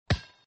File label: floor, basketball; inlogic-basketballmaster